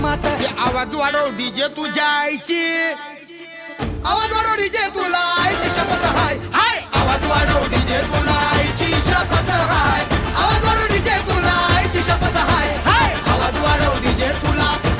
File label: Ringtone